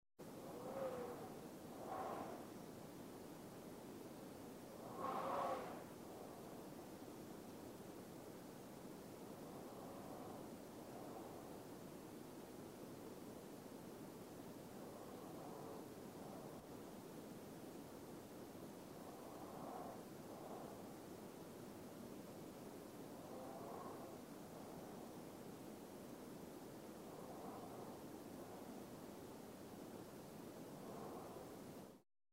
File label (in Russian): zvuk legkogo vetra